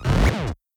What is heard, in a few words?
FX Impacts; FX Bundle 2; Free FX Bundle